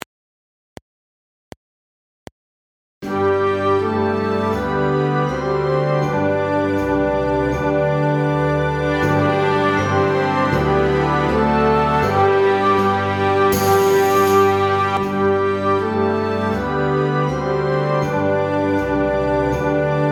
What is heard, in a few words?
Up and Down Guide Track; Exercise 1